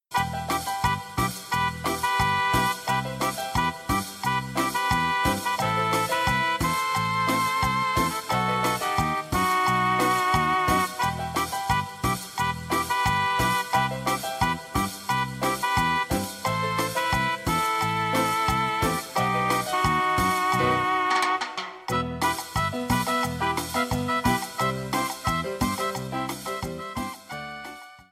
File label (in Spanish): Sonora, tropical